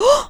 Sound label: Female Surprised